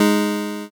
power chord

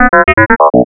alien signal

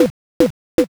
hammer

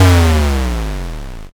explosion m

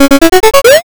picoquestion

